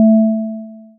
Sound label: beep; nel samples